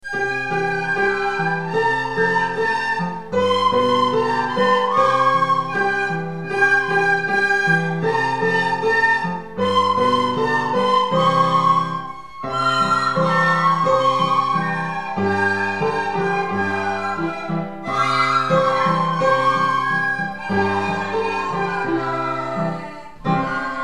flauta

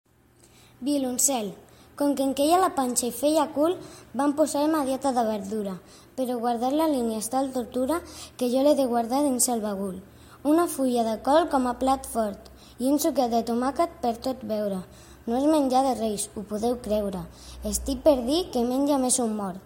violoncel; Miquel Desclot

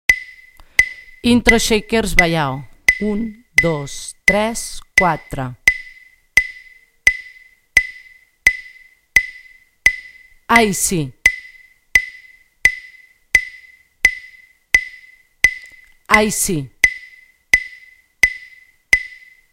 Intro llauna baiao

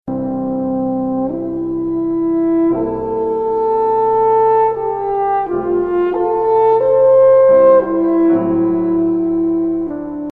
trompa; instrument de vent metall

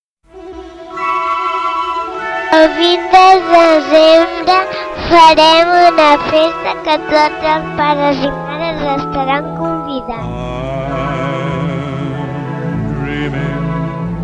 anunci Musica

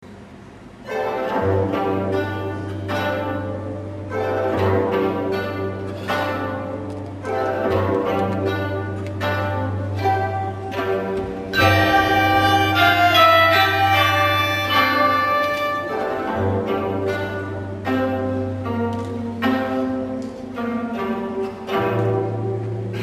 campanes; Concert de campanes

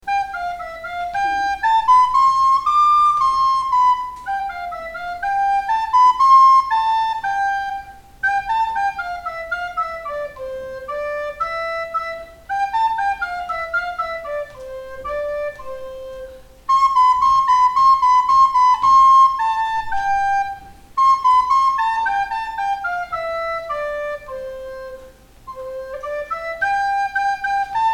pastoreta flauta

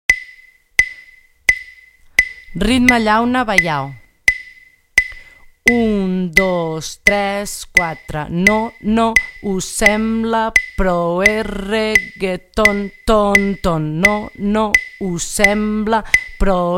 Ritme llauna baiao

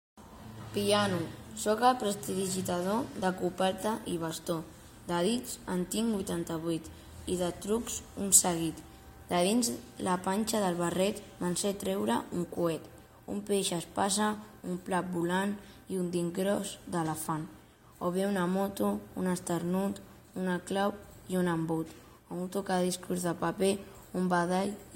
piano

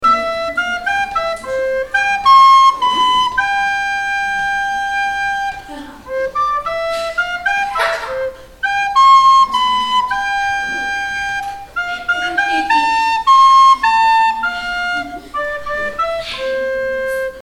flauta, Cançons de flauta; La sardana de les monges

